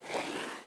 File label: footstep slide cement